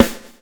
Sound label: swing